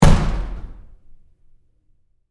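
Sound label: Door Bathroom Stall Close Close Up Very Hard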